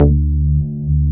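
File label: wave bass D2, analog bass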